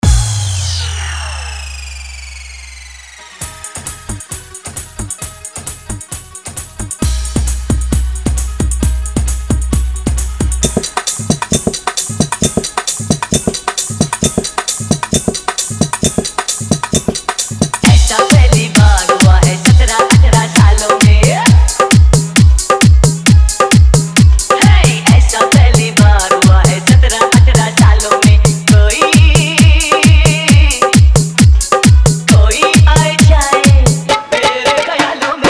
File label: Hard Bass Dhollki Mix; Old is Gold